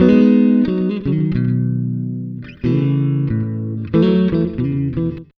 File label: BLUES, Acoustic Guitar Loops; USB Soundscan vol.04; AKAI